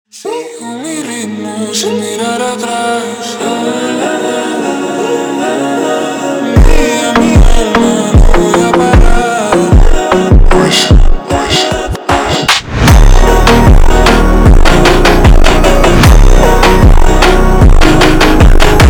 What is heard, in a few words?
Funk super Slowed